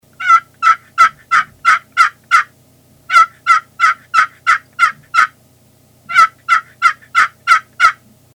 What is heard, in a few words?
Custom Box Call; Porter's Gaddy